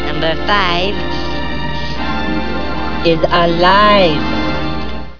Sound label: death; alienarena; johnny5